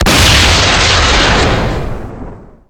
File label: rpg7 shot